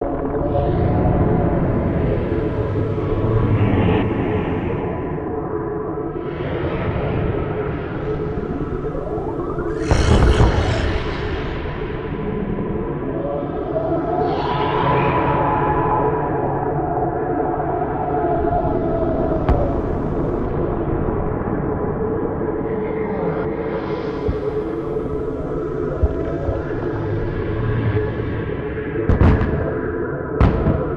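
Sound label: Background Wind Medium Exterior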